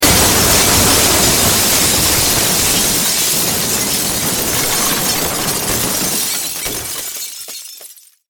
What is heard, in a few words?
Impact Crash Wood Glass; Materials